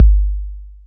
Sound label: kick, Heartbeats